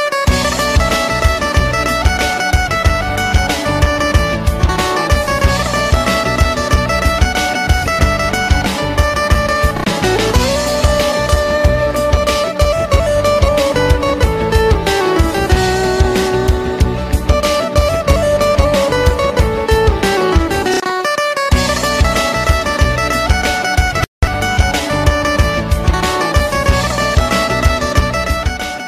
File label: Guitar Instrumental Ringtone